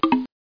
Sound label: Arrow Down; bigtop; kitty5